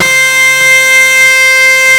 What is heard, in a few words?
WAILING, DIRE LIKE; East West - Global Sounds